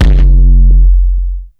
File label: DUTCH KICK; Masterbits; Soniq Elements